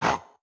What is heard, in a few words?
Chop Attack; Caffiend Caelan